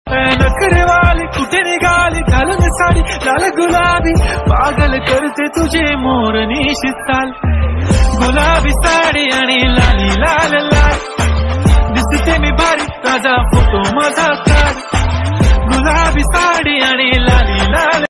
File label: viral song ringtone bgm ringtone